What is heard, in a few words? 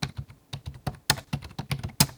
typing; audio feed; Apotheorasis